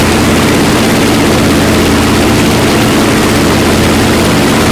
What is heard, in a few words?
b25 side, engines; War Thunder